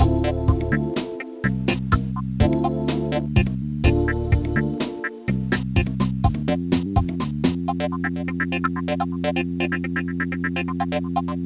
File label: acid step on jazz; Trackers; Modland